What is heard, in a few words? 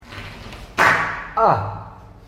fart